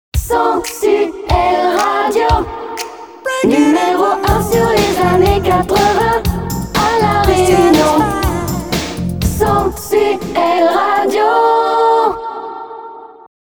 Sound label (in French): jingle chanté